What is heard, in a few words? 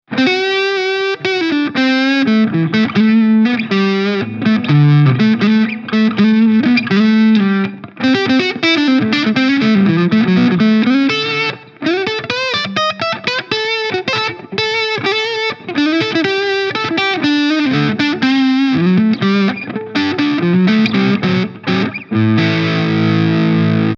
Harley Benton SC DLX Neck Gain 2 h